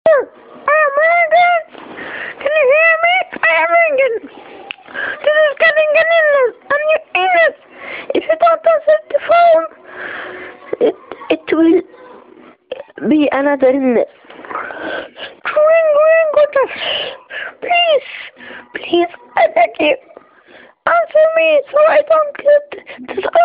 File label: begging phone ringtone